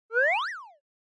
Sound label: Motion Slide High